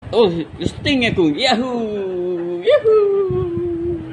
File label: sting